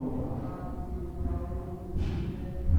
probabile canto ecclesiastico intensificazione pressione sonora; Campione audio con presunto canto ecclesiastico, di probabile origine gregoriana. Registrato nel corridoio; Castello del Catajo-Battaglia

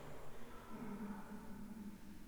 possibile risata non convenzionale Campione isolato

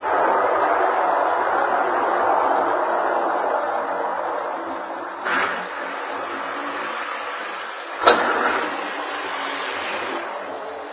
presunto grido di folla con probabile scocco di catapulta e riversamento

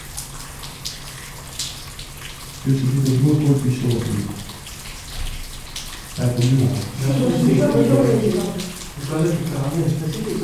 possibili colpi sordi spostamenti cinetici

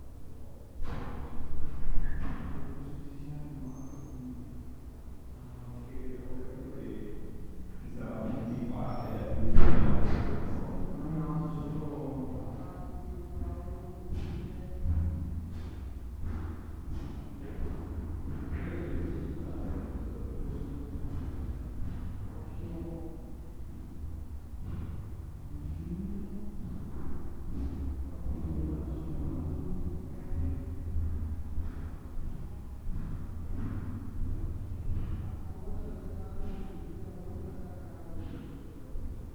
campione non elaborato possibile canto gregoriano

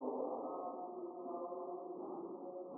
probabile canto ecclesiastico filtro basse frequenze; Campione audio con presunto canto ecclesiastico, di probabile origine gregoriana. Registrato nel corridoio; Castello del Catajo-Battaglia

